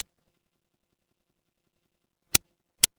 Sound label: office pen